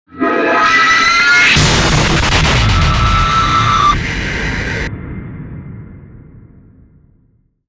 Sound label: Tension Builder Impact